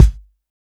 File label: rich lows bass drum g sharp key